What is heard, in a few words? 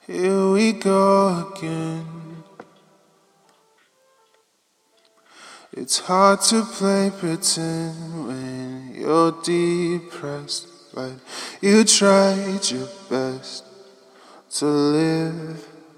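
lofi pop 133 G minor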